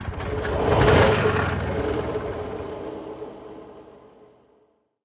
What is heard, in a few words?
t64a engine start, tanks; War Thunder